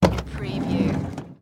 Van Side Door Open

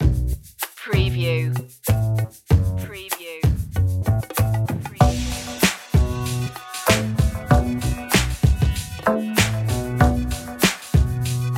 funky hip hop

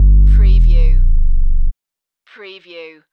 Techno-Trance Sub Bass Loop in G 142 BPM

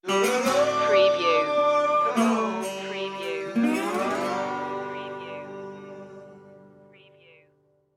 cajun stinger

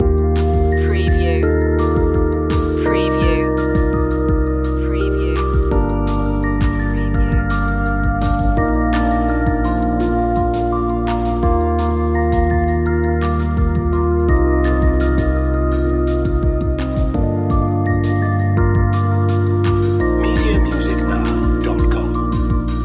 growing strong loop

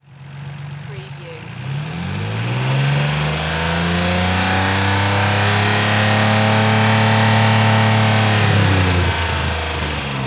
NISSAN 350Z ENGINE LONG REV

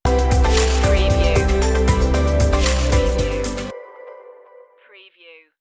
fresh start stinger

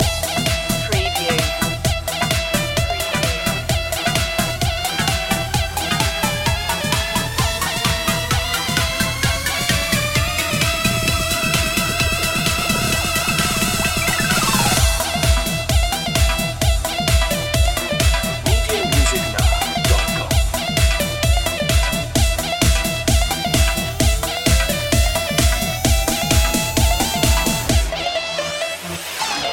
oriental dance loop

